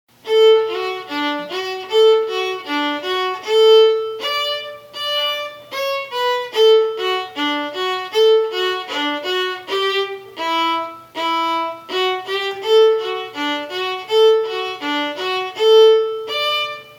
Soldiers Joy slow

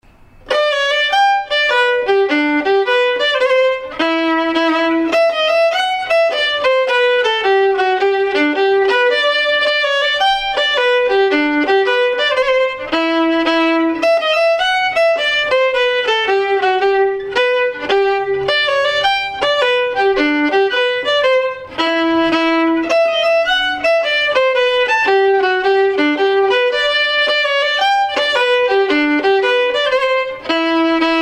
Westmundland Schottiche slow

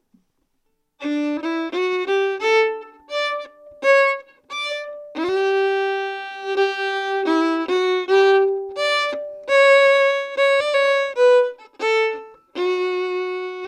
One Step Two Step slow

